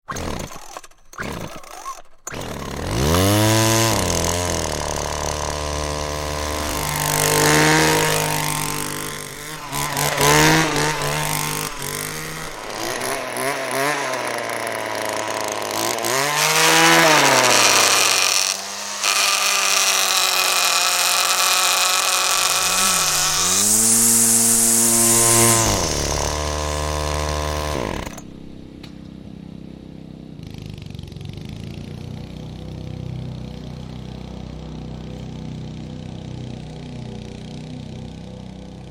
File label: Lawn Care; Big Room Sound